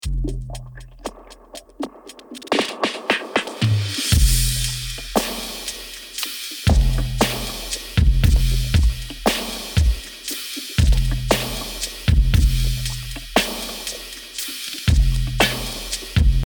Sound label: clean drums